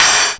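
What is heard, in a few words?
metal, electronic percussion; ELEC.PRCS; East West; Global Sounds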